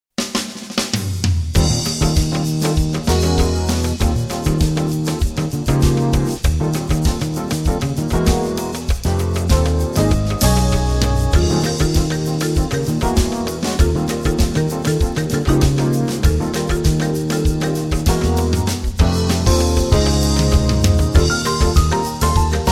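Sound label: Latin mood, jazz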